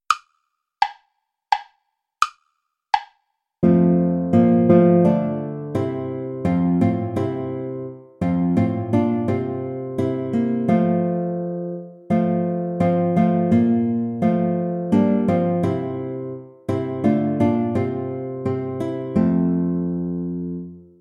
lord sing with lips and heart play along without flute